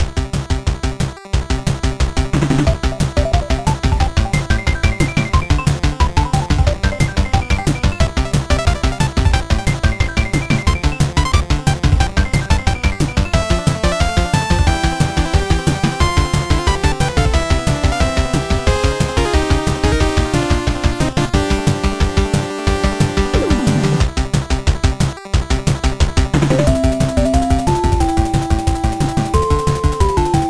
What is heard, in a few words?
village mixed